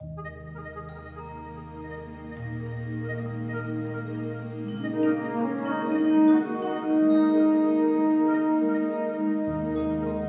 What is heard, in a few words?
GTR; No people can be seen in this scene.; 2018 Guess-The-Rescore film music advent calendar